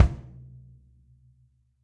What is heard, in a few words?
Kick Wood Room; Drums; Drum Hits; Standard Hits; Room With A Vista; Ableton; Session Drums Studio